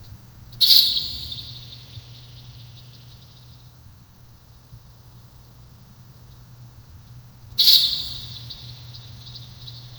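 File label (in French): fleur qui ouvre tranquille, blossom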